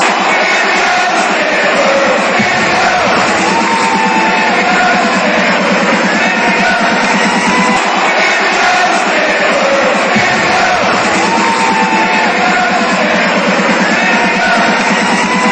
here we go fan chants; steelers

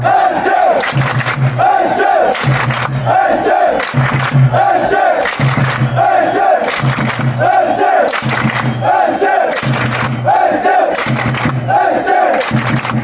claps, fanchants; elche

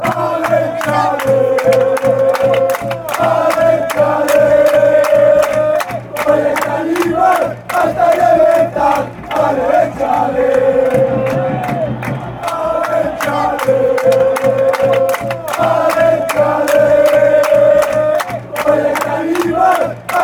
ale ale, fan chants; elche

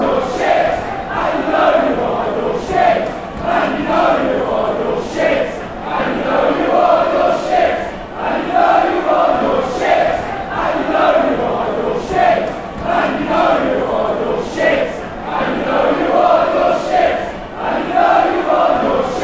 youre sit fanchants

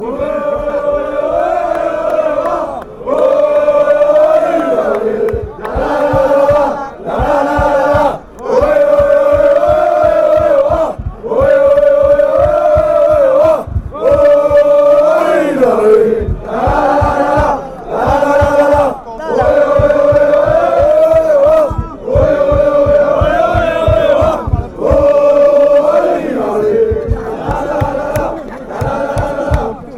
ole ola, fanchants

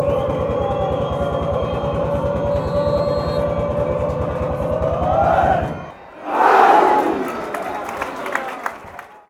goal kick chant; luzern

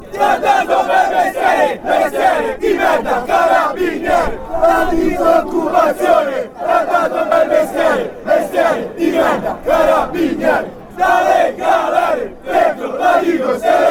black shirt, fan chants

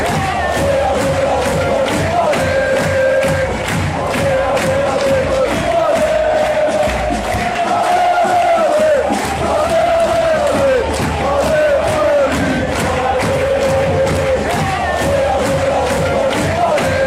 allez allez, fan chants; maritimo

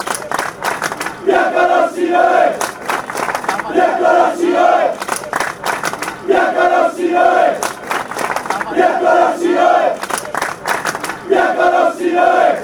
white and red ole, fan chants

